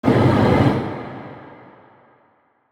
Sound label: attack; Barotrauma; Carrier